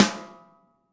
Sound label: DRUM; DRUM SUGAR